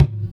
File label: EQ Perc, Percussion Mix